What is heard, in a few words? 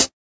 hat; Hats